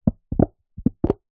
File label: foley plastic tub empty ice cream container bend warp